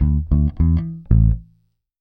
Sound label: Pop, Bass